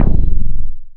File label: footstep; svencoop; ghouls forest; uboa